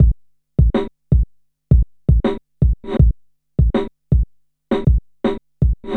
Street Tip, R&B Drums; Sonic Foundry (Sony Creative Software)

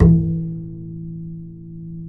E 3, bass, acoustic fx; Spectrasonics; Bass Legends

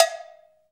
PER COW, Cowbells

